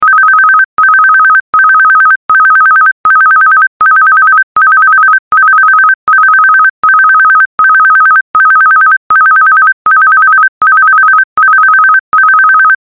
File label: Phone Bell